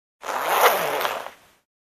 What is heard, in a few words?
Clothe Zipper Close